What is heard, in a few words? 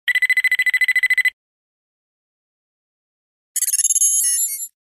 information read signal